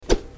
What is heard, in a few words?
Character normal attack; player sound; Gameton; Arcade additional sounds